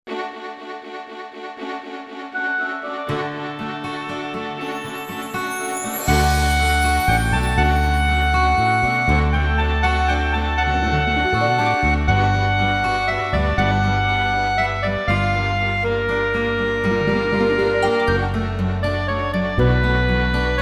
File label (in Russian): отрывок (классич); dance